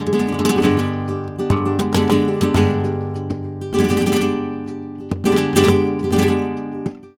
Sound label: flamenco guitar, bulerias y solea